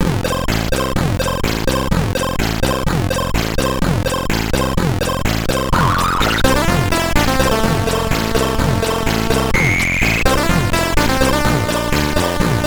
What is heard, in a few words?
SID Stereo; Gerry the Germ; commodore 64